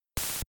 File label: tear